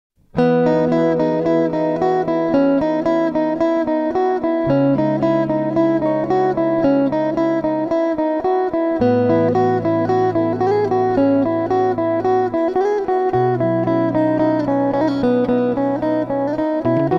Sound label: instrumental ringtone